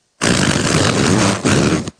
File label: Smstone fart